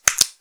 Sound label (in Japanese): 激しめ, ぱちゅ音単発